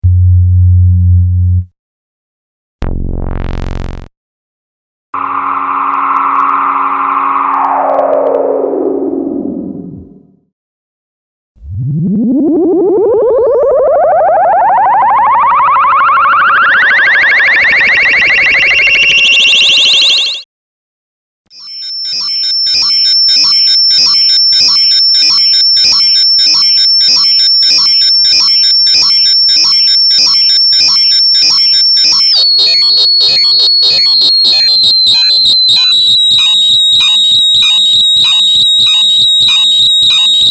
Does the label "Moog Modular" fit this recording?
Yes